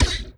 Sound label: PUNCHY, Anime Sound FX; AKAI Anime Sound FX